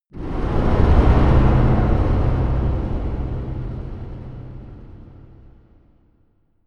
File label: whoosh short metallic